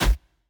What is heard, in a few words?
reels stop; Sizzling 777; wazdan